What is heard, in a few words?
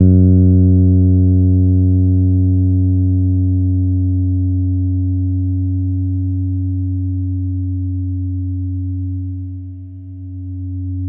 F#2 PP, Piano cp80